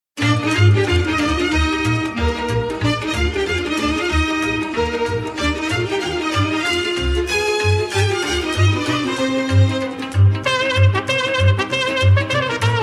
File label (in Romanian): Sirba Mariutei (Sirbe Moldovenesti), Roumanie